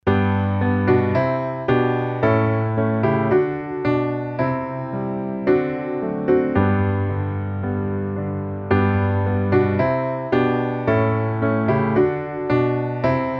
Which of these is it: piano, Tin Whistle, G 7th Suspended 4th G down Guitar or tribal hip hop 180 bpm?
piano